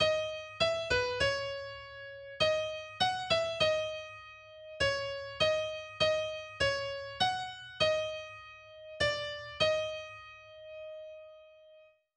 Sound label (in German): Vorübung, der Ton d# bzw. eb auf der B-Saite, Gitarre; d#/eb5; Gitarrenkurs zum Erlernen des Notenspiels auf den untersten vier Bünden